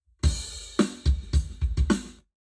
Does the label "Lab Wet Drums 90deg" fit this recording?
Yes